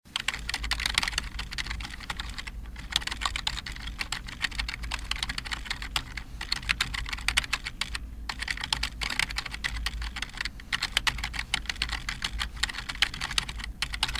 Typing

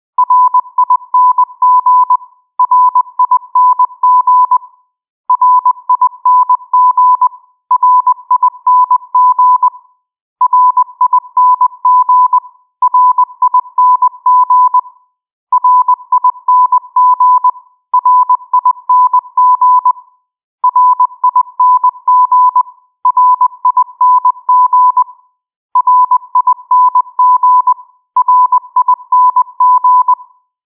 Ring Ring In Morse Code

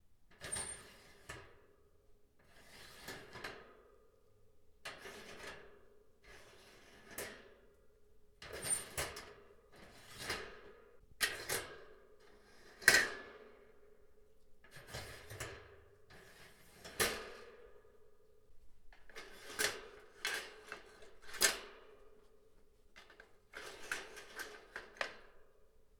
Bird Cage Sounds

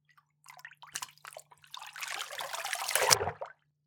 Dipping Below Water

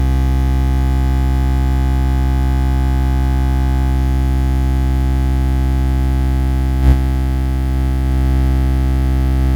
Jack Noise Bass

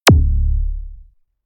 Impact Zap

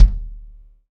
Lo Boom

